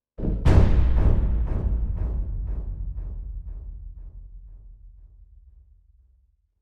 Cello Stinger, Single Cello stab and boom with delayed fade.; Sound Design